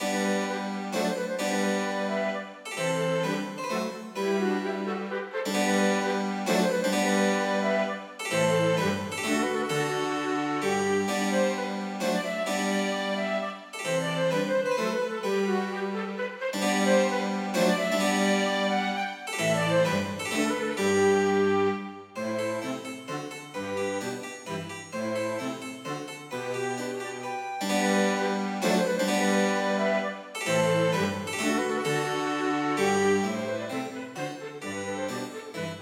Menuetto for fløyte, fiolin, cello og cembalo

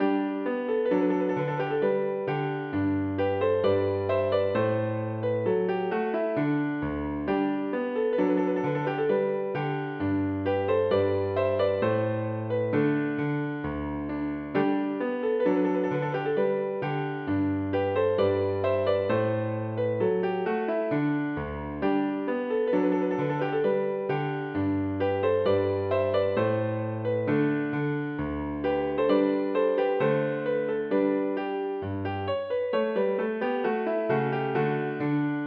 Marchs for piano; Bang; Marchs de Brandeburg

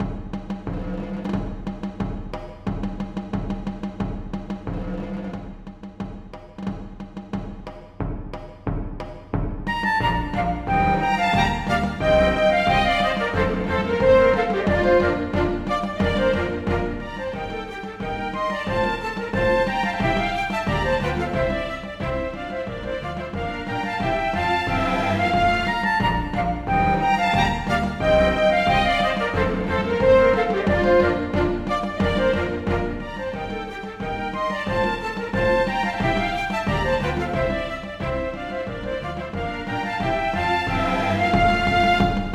Trio for fløyter, strykere og trommer; Aamodt

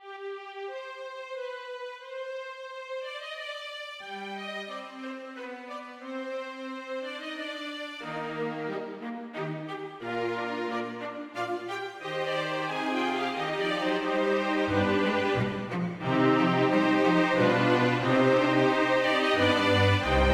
Menuet for strykekvintett

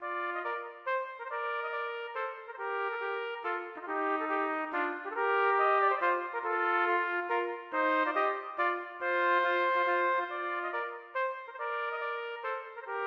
Menuet for trompetduett; Aamodt

